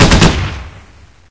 hmg 3 rounds, weapons; hl2

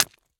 Punch Spurt Blood; Soundopolis; Gore Toolkit HD

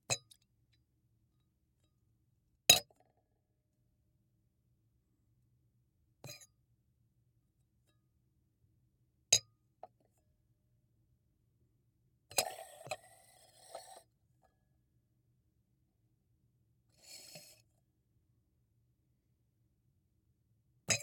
CUP, Crockery & Surfaces; TheWorkRoom Audio Post

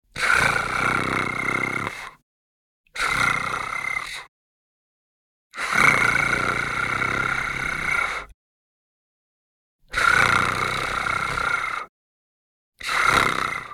TONGUE ROLL AIRY MALE PURR GROWL; The Mouth

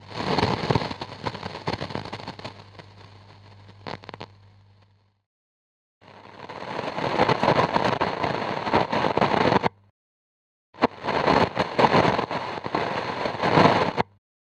Old Radio Tuning Static Noise; MatiasMacSD

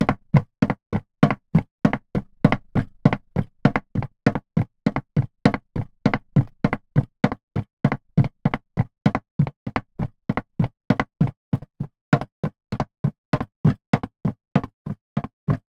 Footsteps Metal Boots Loop Jogging; Studio 23; Ultimate Footstep Collection

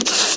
breath, fast zombie; hl2

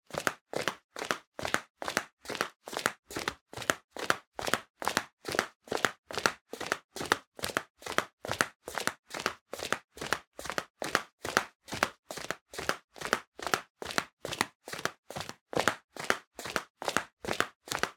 Footsteps - Flip-Flops - 140 fpm - Loop; The Sound Pack Tree; Footstep Loops

